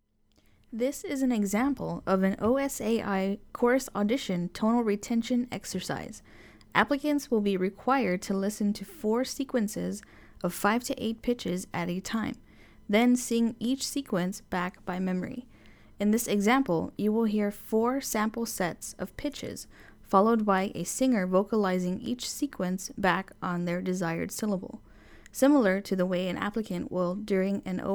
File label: Sample Tonal Retention Exercise